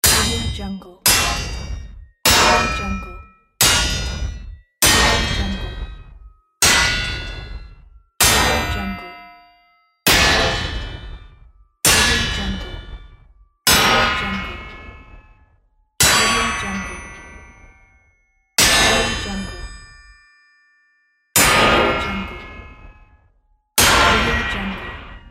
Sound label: defense bar